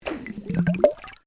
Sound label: UNDERWATER BUBBLES SLOW, Bubbles generated using a bicycle pump underwater